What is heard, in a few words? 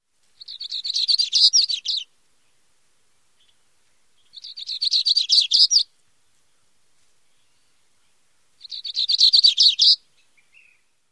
songs